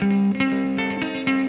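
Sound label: electric guitar, ethno, 160; eJay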